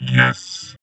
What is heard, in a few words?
VOX VOX, Electro Vox; Sound & Vision; Gigapack II CD 2 (Roland)